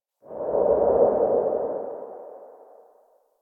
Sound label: Operator Wraith Void Move; Warframe; Focus; Powers; Wraith Movement